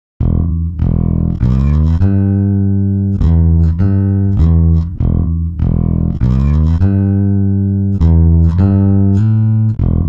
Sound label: bass; apple logic pro monophonic; timestretch